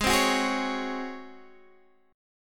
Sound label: Db Major 7th Suspended 4th Ab